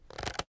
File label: crossbow drawback; mcl bows